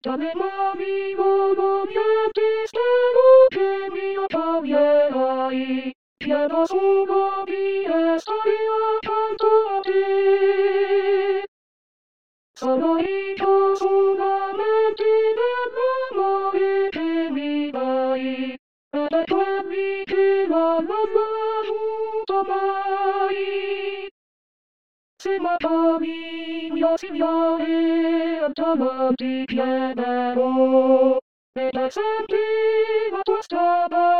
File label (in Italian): Se M accogli Coro